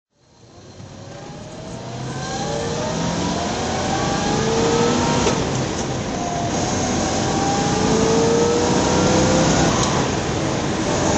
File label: corvette drive; red